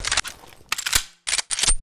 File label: pb reload; STALZONE